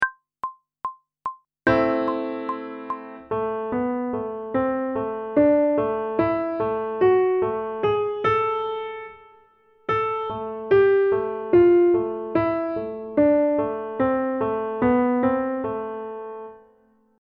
Intervals of a Melodic Minor Scale one octave ascending and descend, pop vocals; grade 8; Technical Work